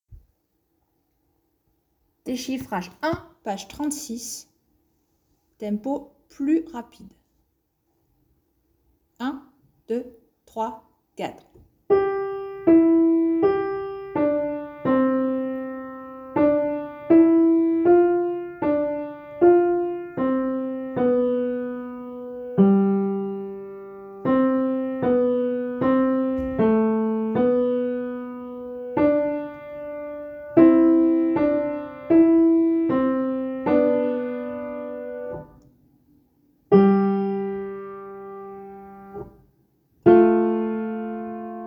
dechiffrage Tempo rapide

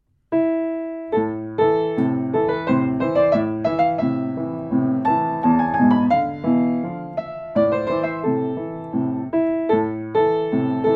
Concerto pour piano

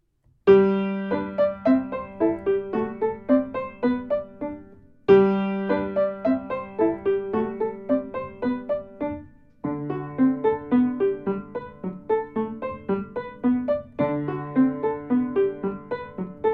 Symphonie no1 en Sol Majeur; opus 11; Joseph Bologne

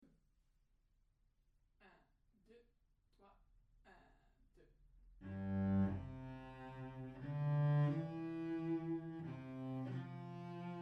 Violoncelle seul Lent

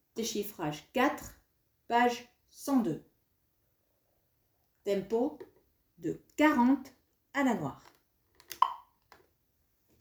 Dechiffrage plus vite 40 noire

